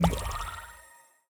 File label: heal; Furcherro